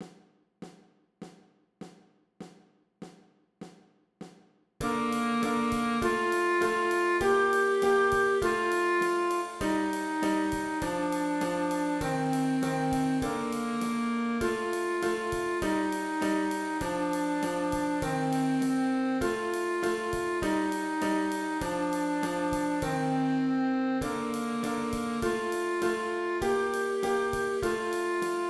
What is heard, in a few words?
Twinkle winds